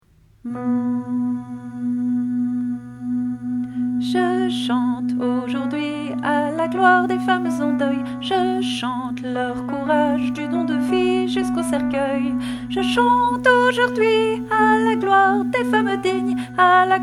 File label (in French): VOIX À la gloire des femmes en deuil Tutti; LA CHORALE ANARCHISTE